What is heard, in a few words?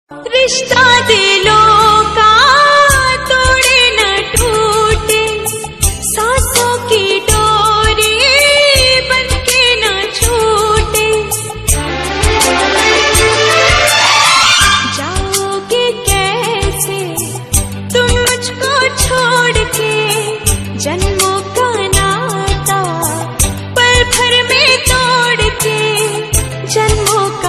Sad Ringtone